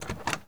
Mustang GT350 Door Open; Automobiles

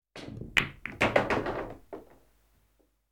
Billiards Ball Bouncing Of Cashion; KMP Film Video Sound Effects

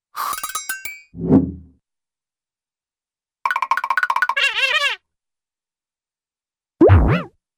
Airy Scrape Block Hits; Cartoon; Hollywood Edge; Cartoon Trax

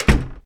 Door Heavy Close; Home & Office; 1001 Sound Effects

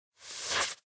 Mould; Blind Quest - The Frost Demon

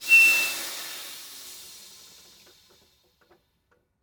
Semi Truck Air Brake; Large Vehicles; Blastwave

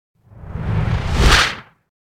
Impact Whoosh Strong Air Whoosh; Hollywood Edge; sound designer's toolkit v2

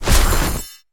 Creature Ranged Magical; Kilta

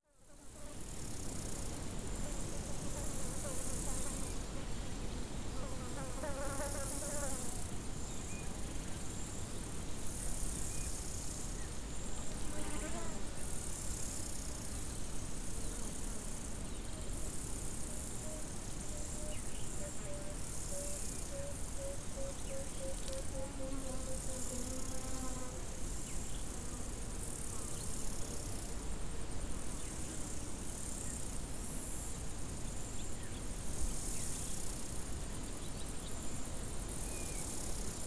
Birds gathering of starlings; Birds, Insects & Animals

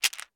Medicine Bottle Shake; Medical; Blastwave

